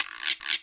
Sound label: GUIRO LONG; Big Fish Audio; Synth City